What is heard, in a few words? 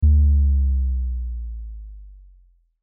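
sound effect of dropped voltage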